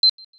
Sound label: Video record, camera sounds; Samsung GT-C3060R